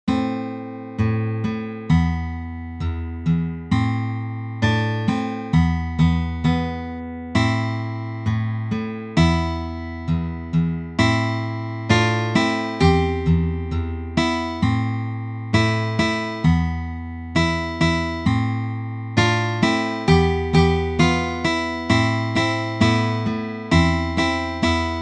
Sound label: Tribal Rhythms